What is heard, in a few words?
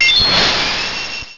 Freeze Shock; pokerogue; battle anims